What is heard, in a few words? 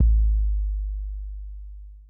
Kick B Hit; Hip Hop Exotica; Apple Loops; Big Fish Audio